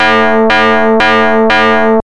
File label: Gongs; ELK-120